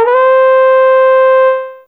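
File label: Trumpet Rise C4, Fairlight CMI IIe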